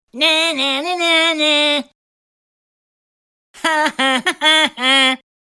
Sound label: Mocking Laugh, Cartoon style ‘Nah na na na nah’ mocking voice; Cartoon Sound Design